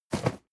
cardboard box medium place slide hard; objekte

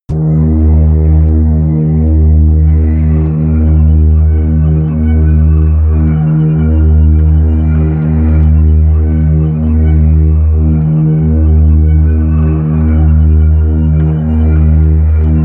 drone apocalyptic bass

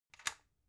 magnetic plug soft, elektronik; objekte

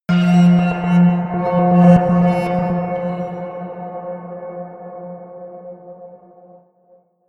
melodic metal movement high, drones

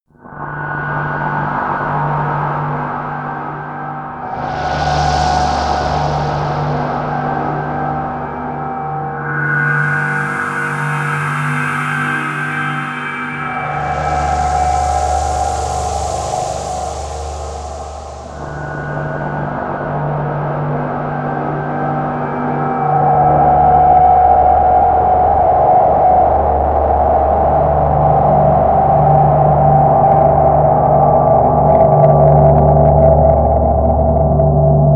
snake pit, music elements; flaechen

